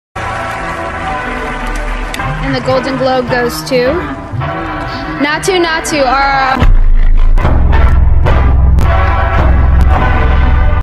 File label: montagem orquestra sinfonica; rrr edit